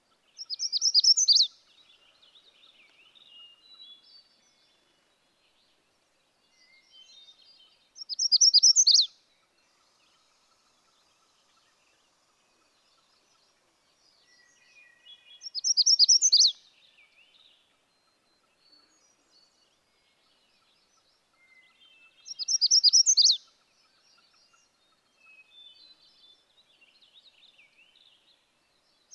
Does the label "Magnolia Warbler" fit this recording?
Yes